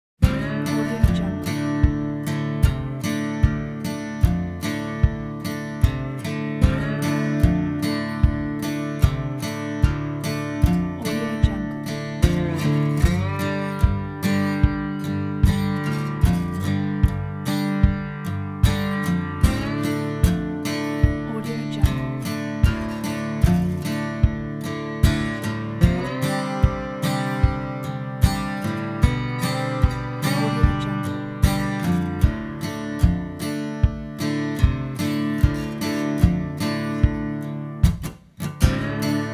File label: southern acoustic